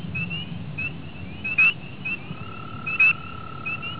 crucifer, amphibians anura

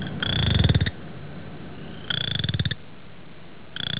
triseriata, amphibians anura

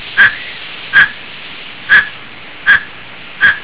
bombifrons, amphibians anura

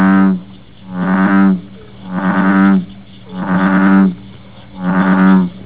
catesbeianus, amphibians anura

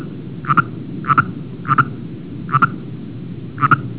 regilla, amphibians anura

